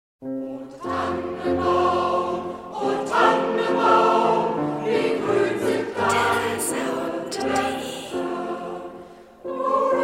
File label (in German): o tannenbaum chor; terrasound